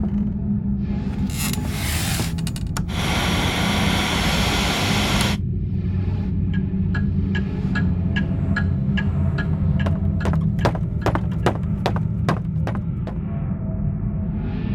Living room; Toy Odyssey The Lost and Found

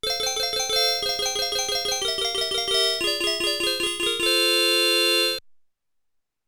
Mother Fairy Music; Pac-Land

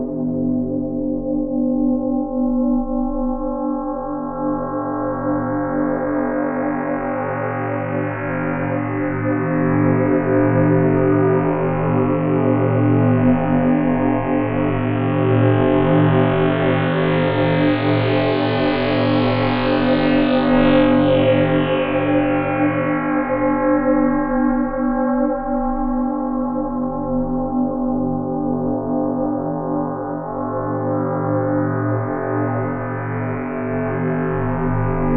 MED Loop; Void Bastards

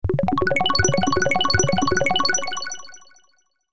Mushroom Appear; Super Mike Classic Adventure Game

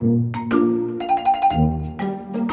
Relaxing Slice Of Life Intro; Eternal Radiance

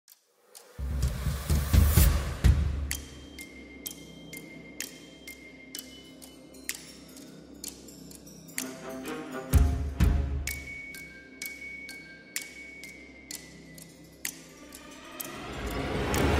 Night Music

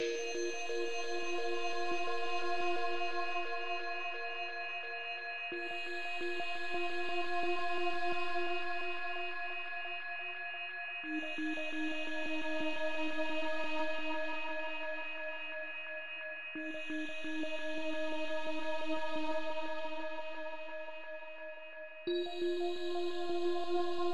fallout ambient

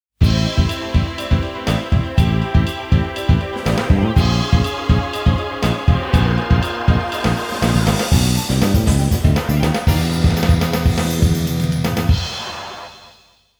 Continue BGM; Virtua Striker; Virtua Striker 2

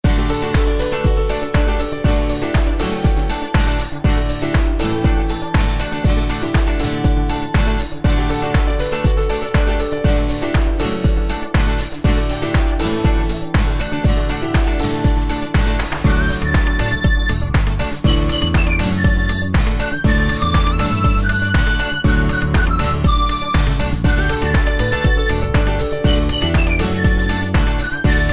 Level Music; Family Restaurant

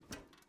metal jump; teardown; ps5 windows xbox series xs

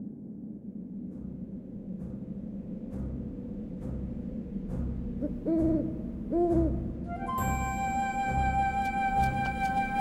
music menu; northern tale 2

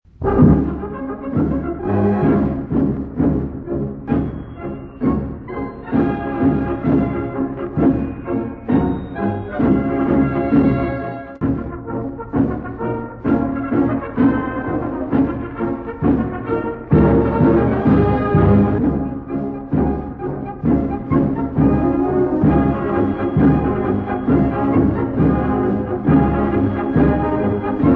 March Music

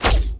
Bow; ChooChoo Heroes

